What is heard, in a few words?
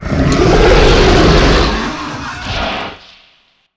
death, dragon